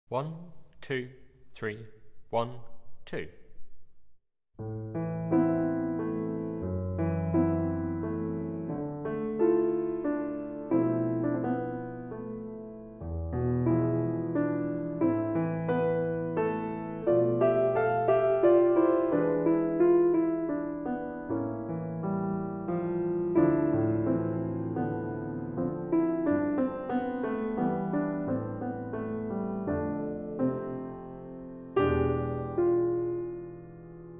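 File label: accompaniment only; from microjazz flute collection 1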